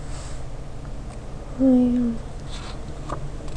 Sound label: violin